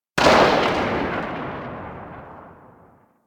Ak Bolto Prime Tail Ext C; Tenno

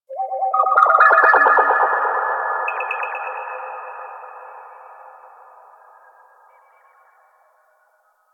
Mine Drone Underwater Idle Vocal

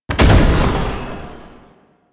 Lasrian Tank Ram Ability Hit; Enemies; Abilities; Warframe

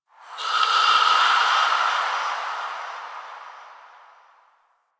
Whisper Air Draft; Ambience; Warframe; The New War; Quests; Zariman

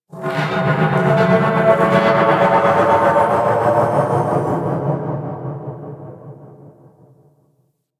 Metal Groans And Scrapes, Asteroid; Warframe; Grineer Asteroid

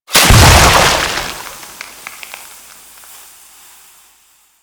Infested Moa Slow Bomb Explosion; Warframe; Attacks

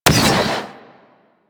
Sentient Ghost Cannon Fire; Warframe; DE; Sentients; Sentient Eidolon Ghost Caster; Weapon

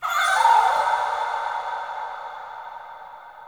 Day Pixies, Ambience; Glades Of Andurin

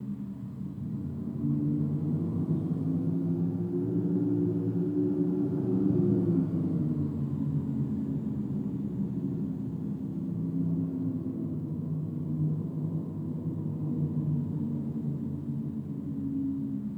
Under City Alley Bed Small, Ambience, Dungeons

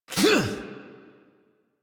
Exert, Adult; Melee Swing; en; Warframe; New War; Adult Operator

